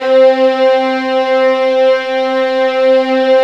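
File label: violin section, Arco Violins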